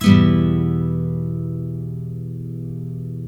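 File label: NYLON E MINOR UP, CHORDS, Guitars; Spectrasonics; Hans Zimmer Guitars VOL-1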